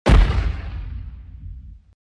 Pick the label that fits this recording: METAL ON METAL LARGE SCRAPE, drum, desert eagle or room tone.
desert eagle